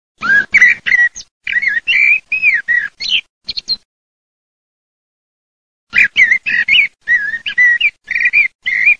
MERLO, birdsound

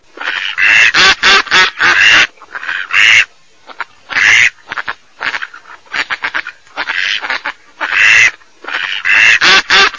germano, birdsound

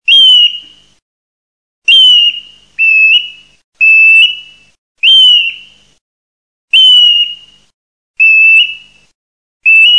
PIVIERE MALTA

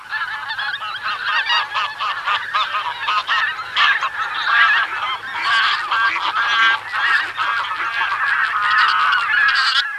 OCHE MISTE

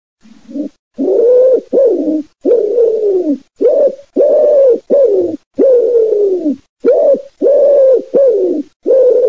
colombaccio

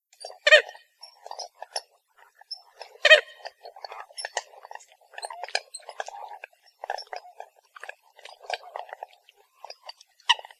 Folaga

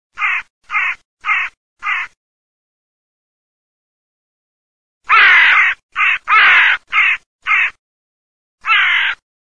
cornacchia

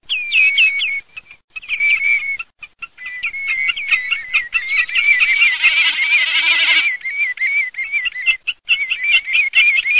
BECCACCINO GAMBETTA

